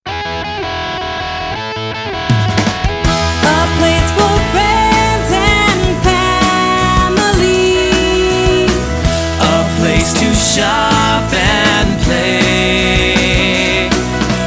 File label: jingle